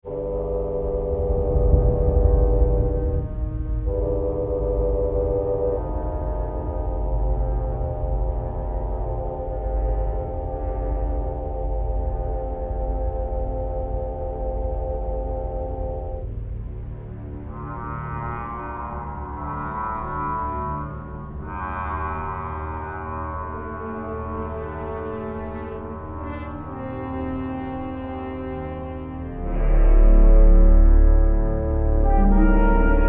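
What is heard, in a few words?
Music Underground Ambience; Star Wars Galaxies An Empire Divided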